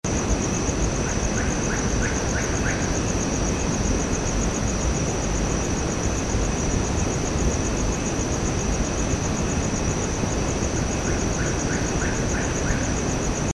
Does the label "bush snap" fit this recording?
No